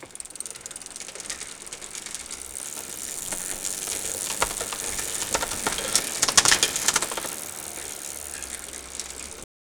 ruedas de bicicleta de bm; bicycle

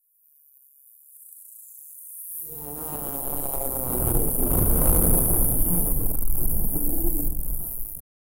insecto que explota; bug

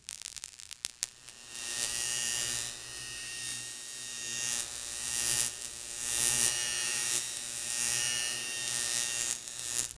electricidad como una des, electrical

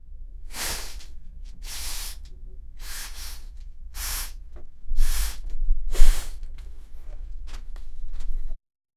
transicion de un video a

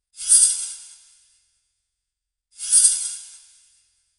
polvos magicos varita; powder

